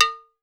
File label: large cow, cowbells; Voice Crystal - Steve Reid Definitive Percussion